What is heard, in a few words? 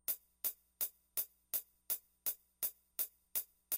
high hat pattern; Programming Drums On The BR-1600CD